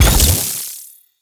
PvP Orb Respawn; Warframe; Pickups

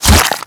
Bullet Flesh; Warframe

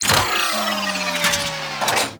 Ship Rolo Dex Close; Corpus Ship

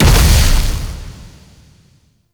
Hazard Pyro Start; Gameplay; Stadium; Warframe; Nineteen Ninety Nine

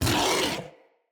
Kubrow Feral Vocal Angry Attack Short

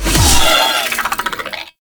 Dive Bomb Attack; Enemies; Abilities; Warframe; Sentient; Brachiolyst

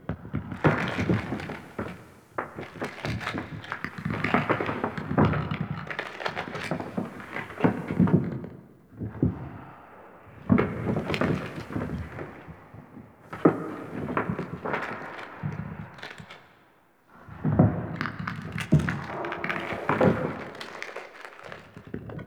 Infested Bone Loop Huge; Ambience; Props; Warframe; Infested Eris

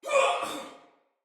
Pain Soldier Female; Battle; Barks; Dialog; Sinecure Soldier VIP Female A

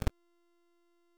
Short Sword Medium Parry Perfect Block Impact

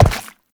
Uriel Step Goo; Warframe; Movement

